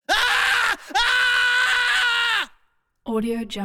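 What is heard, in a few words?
group trauma scream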